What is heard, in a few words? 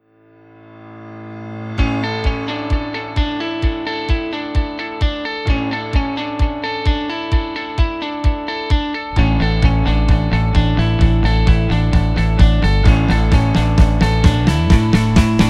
Inspiring and Motivational (Instrumental Short)